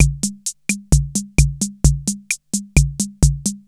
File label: KR33 BEGUINE; Crypton - DrumBase5000